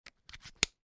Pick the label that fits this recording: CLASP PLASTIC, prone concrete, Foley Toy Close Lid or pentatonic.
CLASP PLASTIC